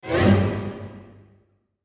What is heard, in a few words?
full str gliss up